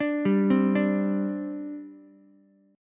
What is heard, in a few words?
G-Suspended 4th-G-7,7,8,5-down-Ukulele, Gsus4 chord; arpeggiated; JGuitar